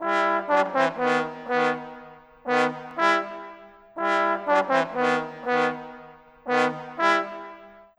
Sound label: Trombones, Orchestral; Sonic Foundry (Sony Creative Software); Orchestral VOL-2; Modern 35